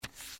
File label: peel start